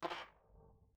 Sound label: ui succes closing a unit; system